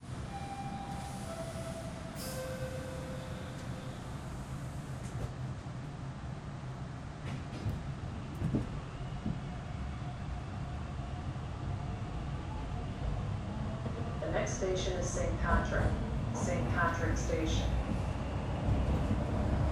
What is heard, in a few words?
Subway station